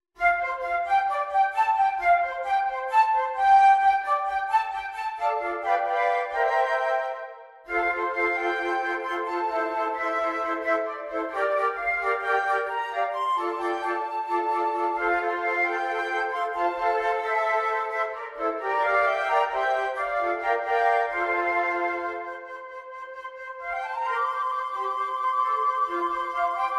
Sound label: four rocking flutes